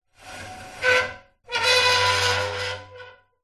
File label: industrial ladder metal slide movement screech metal